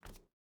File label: footstep barefoot wood walk; Rust